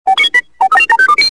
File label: talk, r5d2